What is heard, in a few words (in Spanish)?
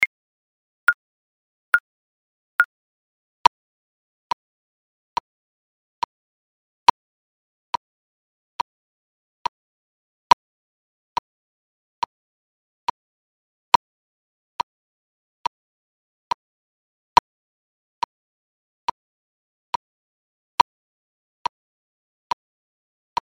Respiracion 70bpm (Sin Nota)